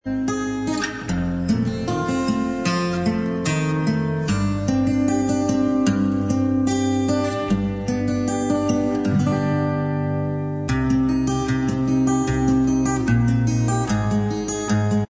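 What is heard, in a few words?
acoustic version instrumental track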